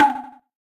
transform tram; HeavenStudioPlus; tram And Pauline

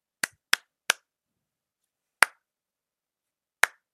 test echo; sm64coopdx

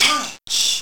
other Punch; HeavenStudioPlus; spaceDance

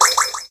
sound effect heal; sm64coopdx; omm-coop

